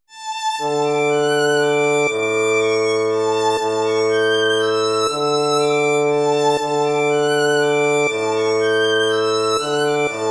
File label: waltz; Ferdinando Carulli